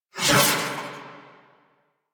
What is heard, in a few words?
Proj Hip Whoosh; Warframe; The New War; Quests; Weapons; Omicrus